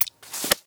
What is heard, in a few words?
docs close